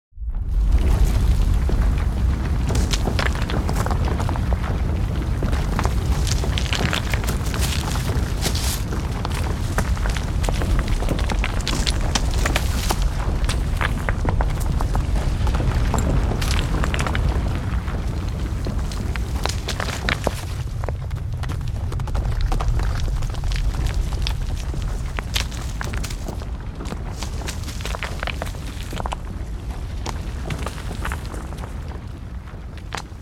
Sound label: Earthquake building collapse debris large rock destruction